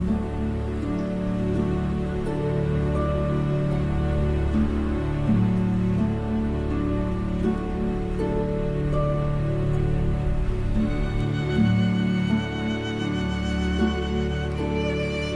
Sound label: Meditation for violin and orchestra; Thais